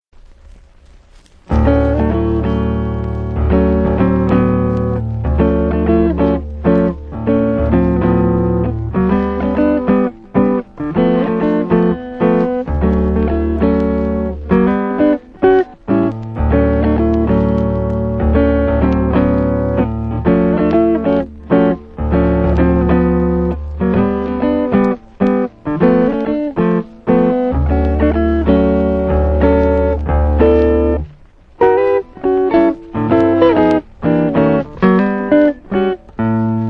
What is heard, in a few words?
promo psa guitar bed; Guitar theme used extensively for promos and PSA's; 1960